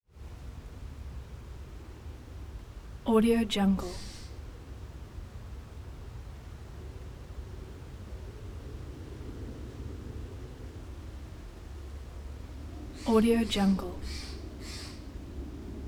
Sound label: wind through trees distant traffic rumble crow